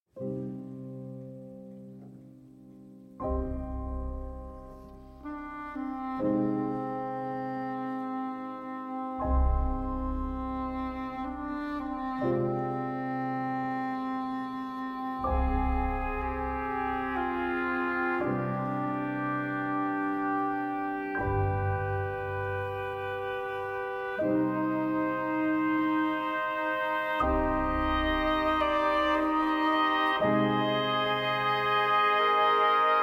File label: Piano, Oboes, Strings; Lamentoso